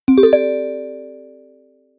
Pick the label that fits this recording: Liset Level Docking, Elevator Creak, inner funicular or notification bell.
notification bell